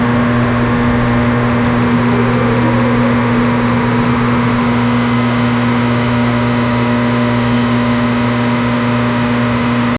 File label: the sound of the ac, air conditioner